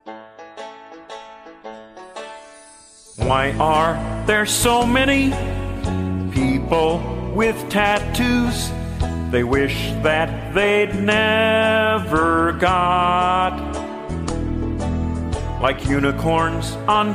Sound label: Character Singing; Kermit The Frog